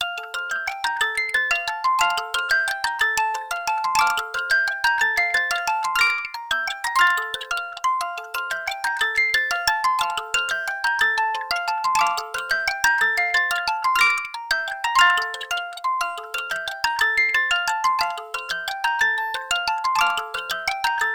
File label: Timer; TMP Math Weasel; Jackbox Games; The Jackbox Party Pack 3